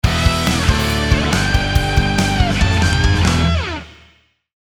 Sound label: hard jingle short